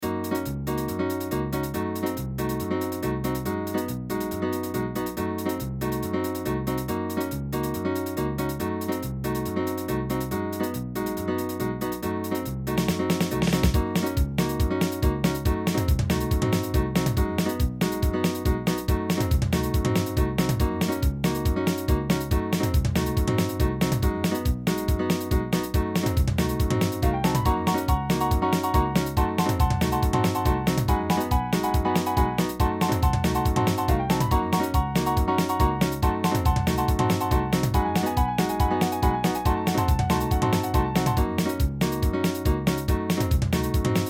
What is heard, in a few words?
Theme from the Guitar